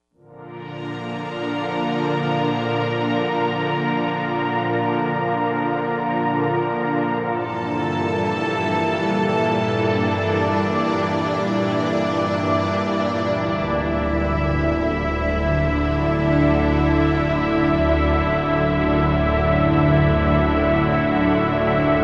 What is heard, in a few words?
Slow Lush